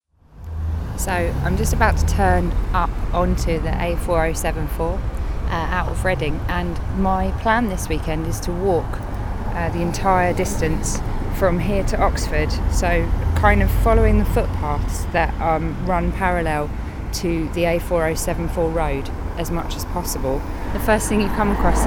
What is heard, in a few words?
walk